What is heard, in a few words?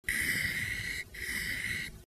pencil